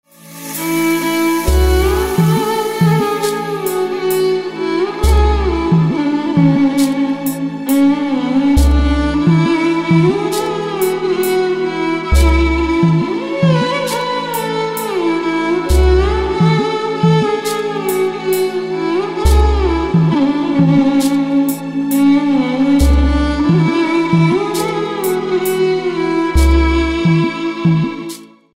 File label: Violin